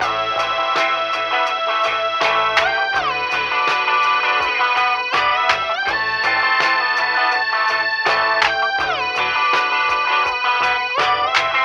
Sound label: keys, Phonky loops